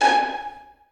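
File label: 1st violins pizzicato g5; Sonatina Symphonic Orchestra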